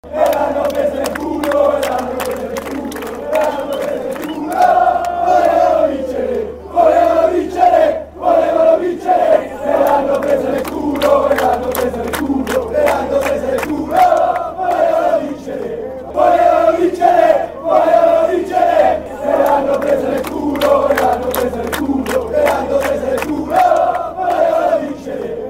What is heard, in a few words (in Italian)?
Volevano Vincere, cori curva nord